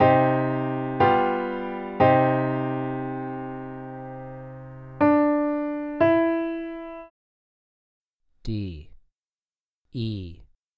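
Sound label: 2 Note Melody Minor d3 e3, piano; melodic ear training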